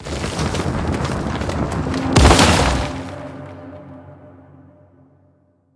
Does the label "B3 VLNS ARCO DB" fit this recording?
No